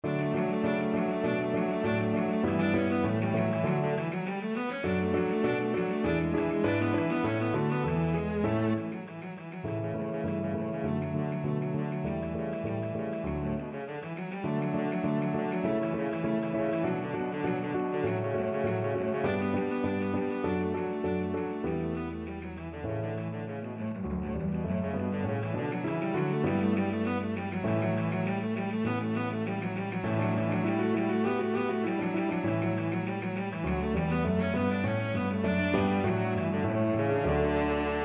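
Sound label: violoncello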